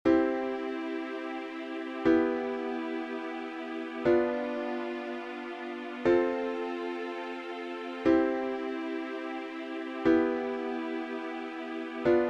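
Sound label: Chord Progression I iii vi degree IV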